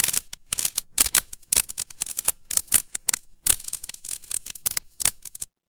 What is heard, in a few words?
fire electric crackle